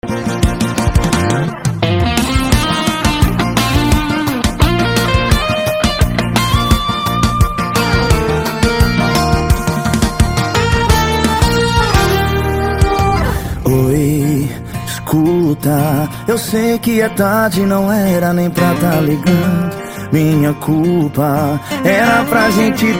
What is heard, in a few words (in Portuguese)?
sertanejo